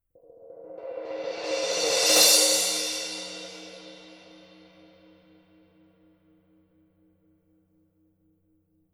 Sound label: Color Sound 900 Black Crash Paiste